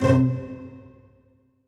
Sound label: PIZZ, Dance Mega Synth